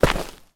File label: footstep; skyscape